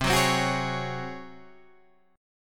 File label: C sharp Major 7th Suspended 4th C